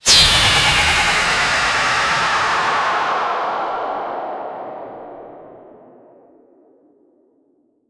ultra fast whoosh sharp; cyber

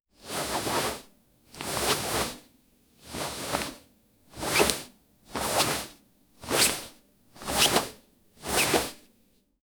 dry to slightly wet cotto, cloth

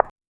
rapid hollow chattering, buckles

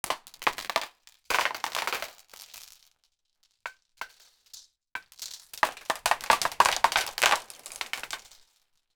rooftop debris clinking

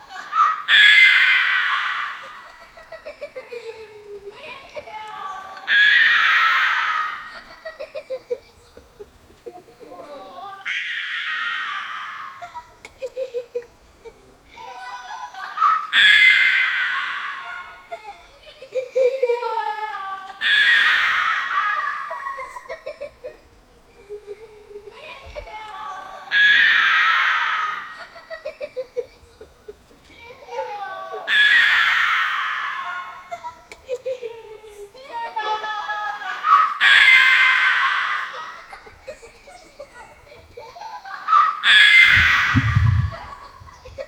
many children laughing fi

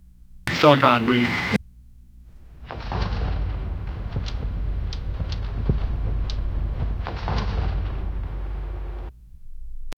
soft rustling of parchmen; parchment

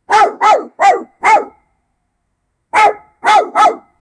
barking of a happy poodle

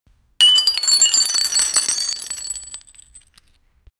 arrow travelling sound

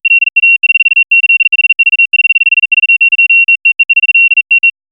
a continuous sound indica, computer

